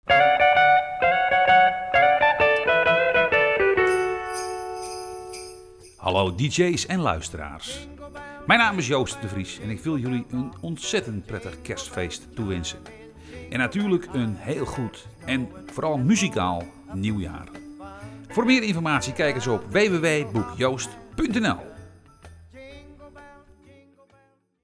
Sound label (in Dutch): Jingle Kerst